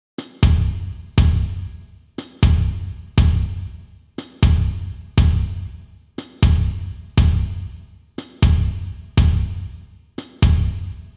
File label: Salsa 60 bpm